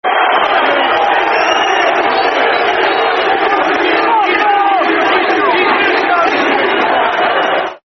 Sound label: Football Chant (Soccer Song); Cheating Bastard; Sheffield Utd Sufc